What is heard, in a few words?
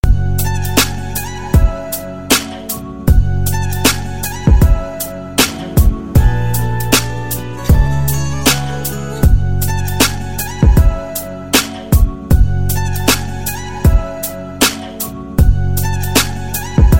Guitar and Bass